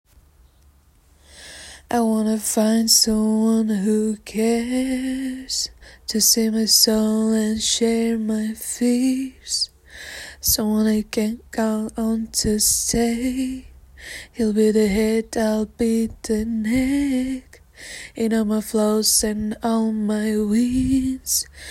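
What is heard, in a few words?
bridge, Rnb beat with minimalistic design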